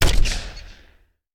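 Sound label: Artillery Grenade Launcher; Sound Ideas; Guns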